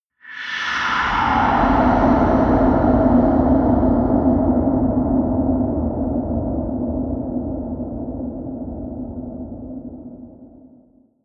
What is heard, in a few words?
air sweep long